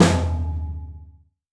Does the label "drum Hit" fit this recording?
Yes